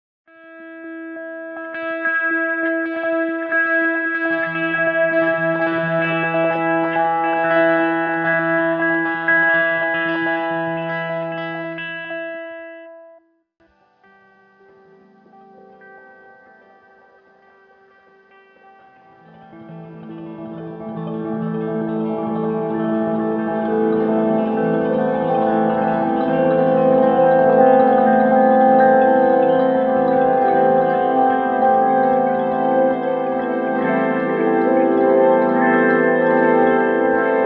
G steel; Torchlight III; PS4

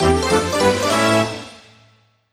Key Item; paper mario the thousand year door complete

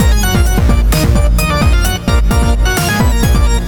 Electro Charge

